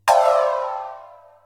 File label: Pling